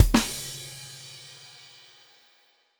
drum roll fin; Hollow Knight

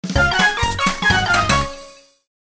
Minigame Finished (Party)

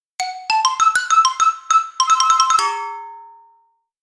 Failure; Kumatanchi

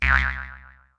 Box

